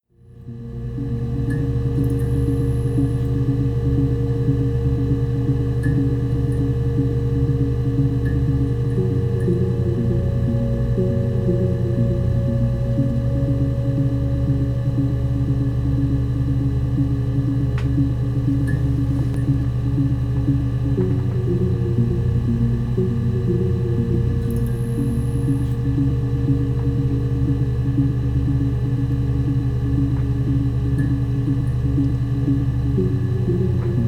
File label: Research Music (Ambient); Her Story